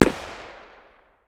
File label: ak medium distance, 5.45x39mm; tacz-tweak-pack; taczmechanics